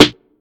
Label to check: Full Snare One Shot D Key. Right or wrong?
Right